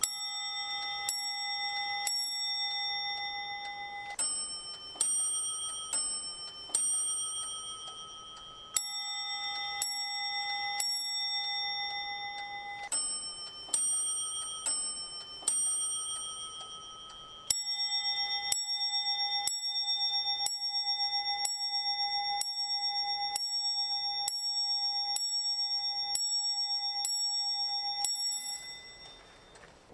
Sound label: clock ringtone; getty